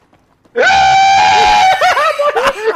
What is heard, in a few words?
ahhhhhhhh